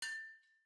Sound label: beat toast; goldentavern